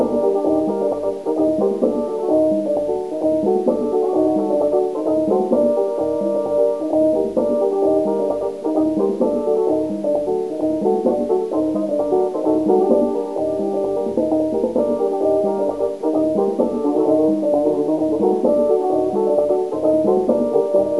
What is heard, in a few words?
radio thru wall